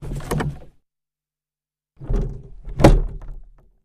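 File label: Carriage Door Interior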